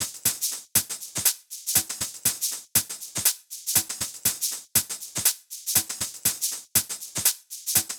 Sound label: Hh Sh Loop 120; Equipped Music; Loungin House